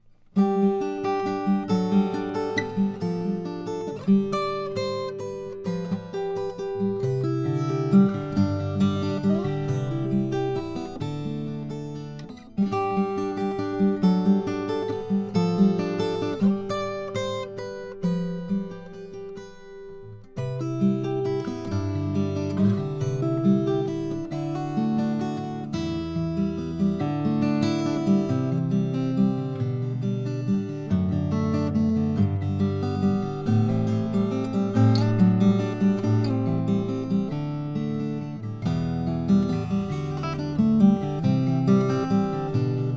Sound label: s50 sinker redwood top, guitars; lowden